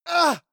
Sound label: male combat hit soft; soundpool